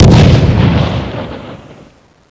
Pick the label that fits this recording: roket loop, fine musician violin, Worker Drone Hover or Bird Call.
roket loop